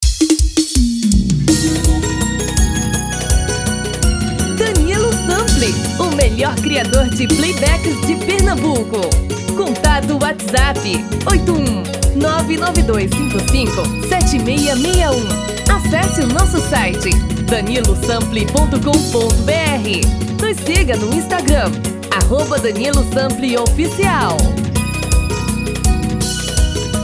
ELETRICO tom masculino

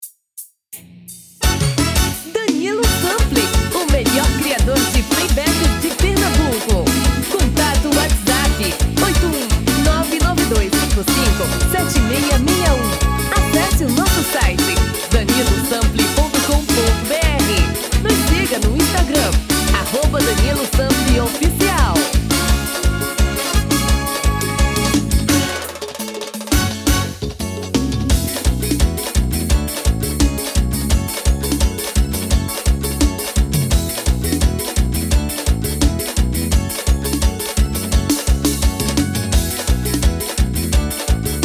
Caboclinho um tom acima; tom feminino

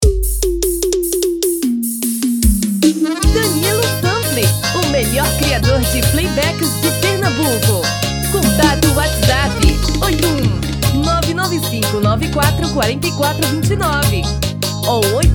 Piseiro

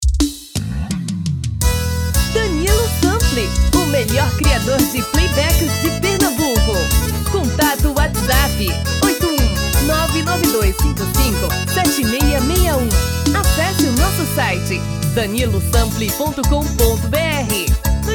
Xote tom feminino

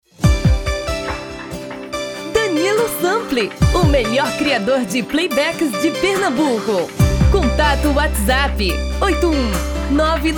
Brega

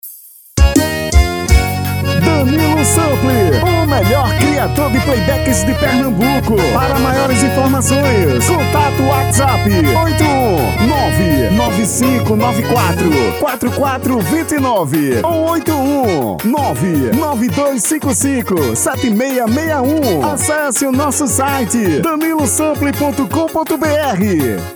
XOTE

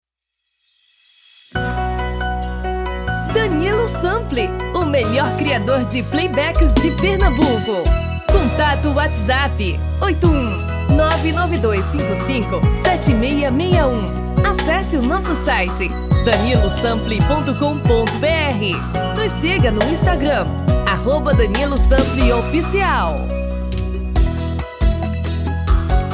Brega tom feminino; Vers

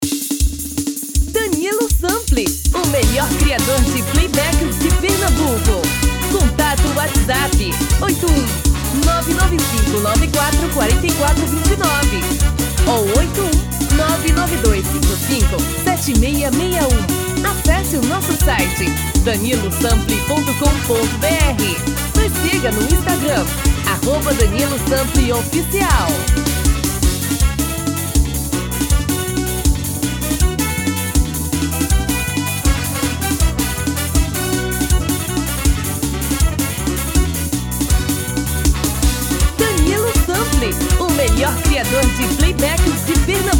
frevo machinha; pout pourri

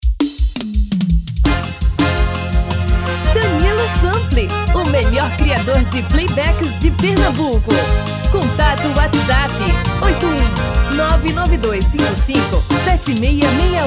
Arrasta pe

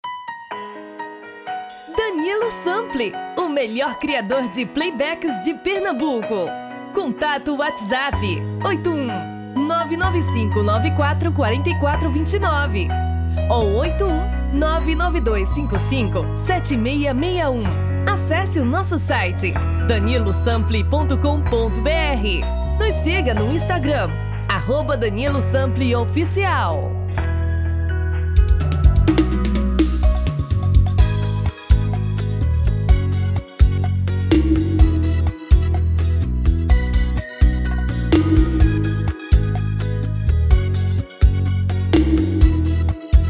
Brega

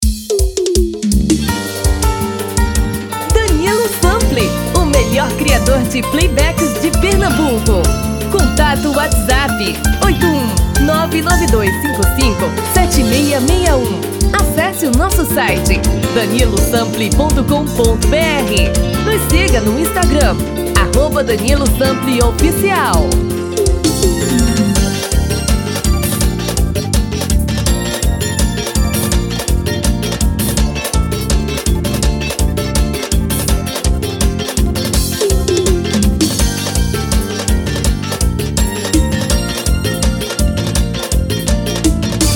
feminino

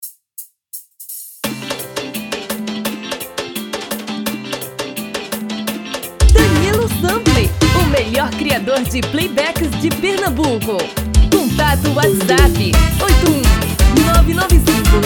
dois tom abaixo

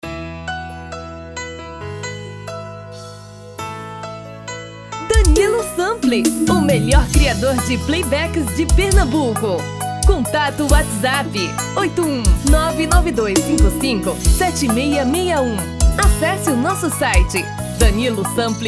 tom feminino

